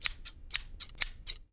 SCISSORS; AKAI S-Series CD-ROM Sound Library VOL-6

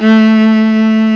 LOW STR, LOSTRING; M8; CMI Factory

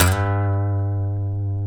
Guitars FUNK; Spectrasonics; Hans Zimmer Guitars VOL-1; TAKA FUNK

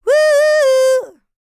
licks, vocal, dance; ILIO; Vocal Planet

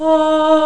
VOICE & BELL

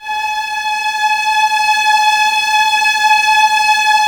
violas A 5, String Ensembles; Miroslav Vitous; VLS 1+2

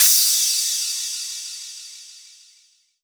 Crash, Cymbals

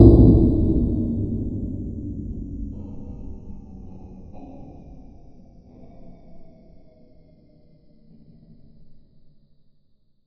big clank

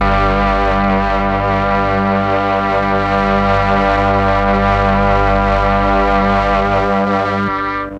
MEL STRING; ILIO; Propeller Island

